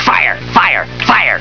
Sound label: fire